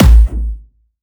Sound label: Distortion Kicks, Bassdrums